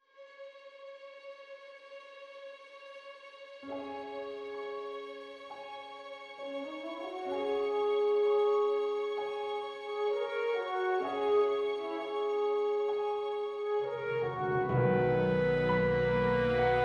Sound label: solo horn